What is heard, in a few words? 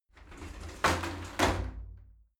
shower slide door open regular